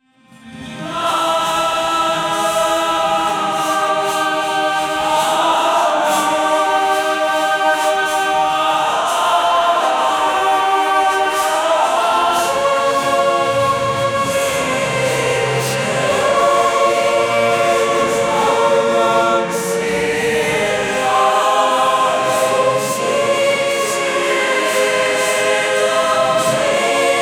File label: epic glory choir with